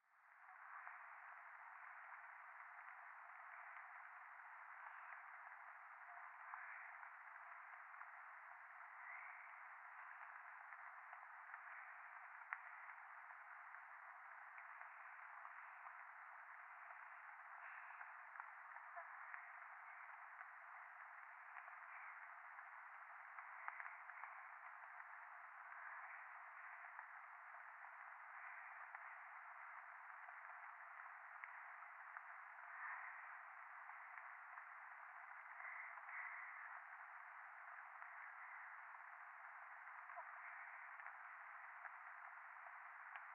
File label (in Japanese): 右耳 密着 吐息 雨